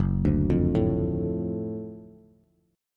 Gb Minor Major 7th Sharp 5th Gb down Bass; GbmM7#5 arpeggiated; JGuitar

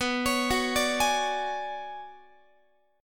B Minor 6th Add 9th B, Guitar; Bm6add9 arpeggiated

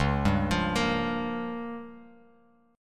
Db 6th Flat 5th Bass Standard, Db6b5 chord; Db6b5 arpeggiated; JGuitar

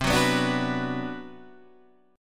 B Minor C; Bm/C strummed; JGuitar

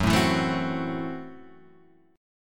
F sharp Minor 7th Flat 5th F sharp; F#m7b5 Chord {2 0 2 2 1 2} strummed

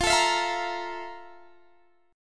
F Major 11th Guitar Half Step Down

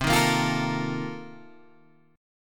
C Minor 13th C Guitar Open G

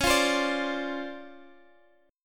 D-Minor 7th, C sharp; Dm7/C# strummed

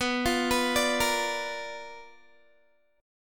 B Minor Major 7th Flat 5th Guitar; BmM7b5 arpeggiated

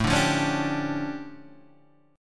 D Minor Major 11th, A

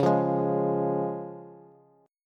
Db Major Db Bass; strummed; JGuitar

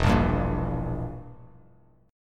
E Diminished E, Bass Standard; Edim strummed; JGuitar

